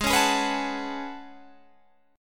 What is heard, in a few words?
A Major 13th, guitar